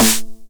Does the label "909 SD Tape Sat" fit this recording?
Yes